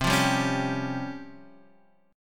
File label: Db Major 7th Flat 5th C